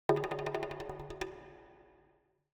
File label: Jungle Drums In The Middle Of The Jungle - Slow Down Thin Hits; Hollywood Edge; sound designer's toolkit v2